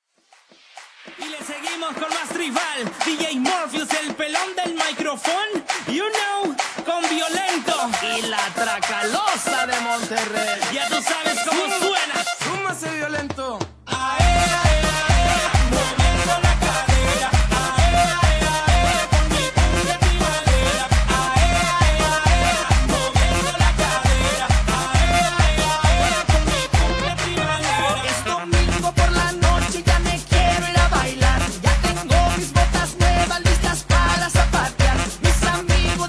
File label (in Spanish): cumbia tribalera